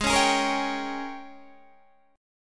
G sharp Major 7th Suspended 2nd Suspended 4th Guitar Open D